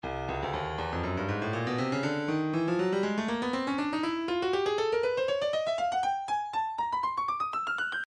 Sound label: breakbeat 120 bpm; Tailfeather A; Stylus Breakbeat Midi Files